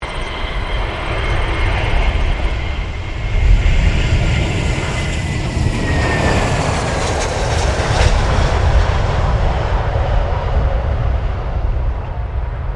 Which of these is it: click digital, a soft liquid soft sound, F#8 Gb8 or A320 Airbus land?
A320 Airbus land